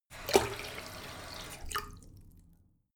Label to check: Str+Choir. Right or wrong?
Wrong